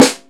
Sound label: short snare one shot C key, snare one shot tuned to the C note. Loudest frequency: 1943Hz